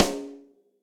Snare Rim Room; Drum Hits; Standard Hits; Room With A Vista; Ableton; Factory Packs; Session Drums Studio

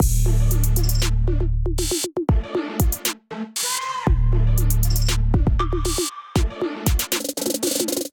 Abyss Kit groove 59 bpm; MIDI Clips; Ableton; Factory Packs; Beat Tools

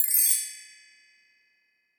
Belltree, Latin Percussion; Drum Hits; Ableton; Factory Packs

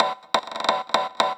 175 D&B Perc; Dance DJ; Percussion; Misc Perc

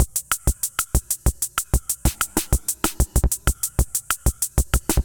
D55 190 Beat, Drum Machines; Lorimer; Ableton

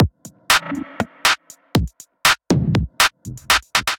Kit, Drums; Night Shade Kit; Ableton; Skitter and Step

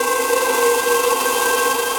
Whispering Strings, Dark Textures; Instruments; Ableton; Mood Reel

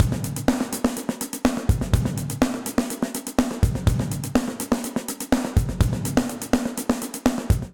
Speed Snare Dark Plate Verb 124bpm; Session Drums; Session Drums Club; Ableton; Factory Packs

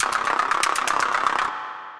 FX Loop; Effects; Burnt; Ableton; Glitch and Wash

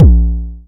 analog tonal, Kickbank; Ableton Foundation FreePacks

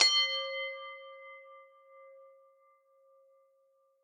Desk Bells, Fleamarket Percussion; Instruments; Ableton; Factory Packs; Lost and Found

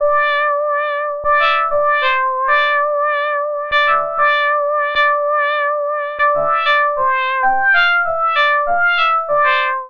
Organ Bass D Minor 97 bpm; Melodic; Tonal; MIDI Clips; Drive and Glow; Ableton; Factory Packs

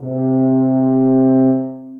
Brass Ensemble (Multisampled), Tranquil Winds; Ableton; Mood Reel

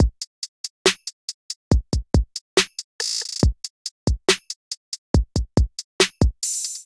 MPE Rhapsody Kit, Trap Drums; Trap Drums by Sound Oracle; Ableton; Factory Packs

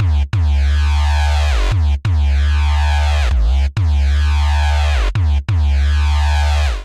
Reese F# Minor 140 bpm, bassline; MIDI Clips; Tonal; Skitter and Step; Ableton Factory Packs

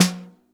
Bottom, Snare

